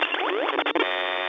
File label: Bleehep Do Do Dee